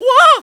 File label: whoa; DS; ML BOWSERSINSIDE; Mario